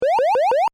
Retro SFX loop, Retro Style Loop, Generic Game Style; Tech; Tech Sound Effects